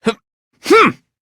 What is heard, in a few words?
h hmm